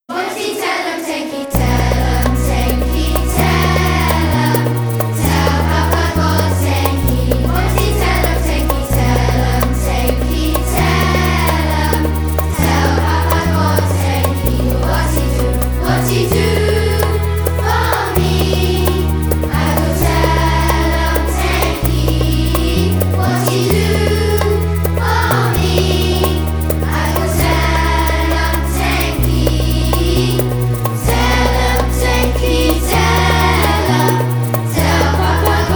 Tel am tenki with backing, Catholic Primary School; St Anthonys